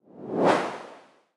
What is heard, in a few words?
sweep even longer; CrossCode; battle